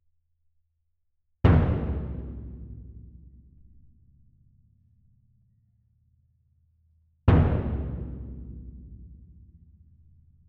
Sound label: Perc